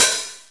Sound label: 909 O HAT, Ensoniq ZR-76, Drum Machines